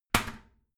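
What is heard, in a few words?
toilet seat lid slam regular, bathroom; haus-garten; badezimmer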